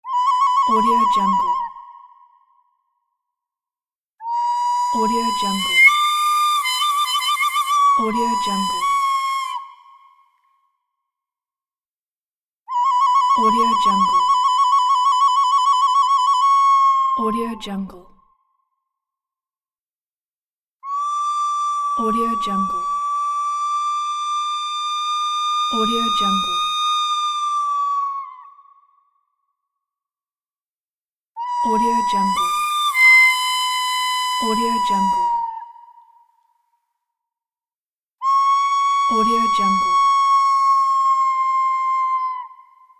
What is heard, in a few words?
flute sounds